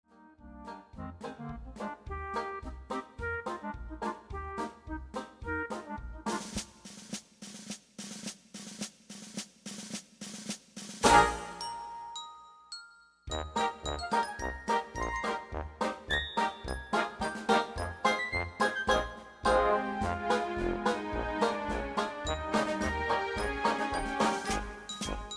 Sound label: motherhood march in E flat